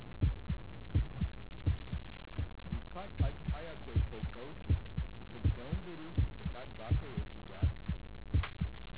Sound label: fire; Golden Record